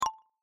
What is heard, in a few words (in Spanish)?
borrar; Emojiji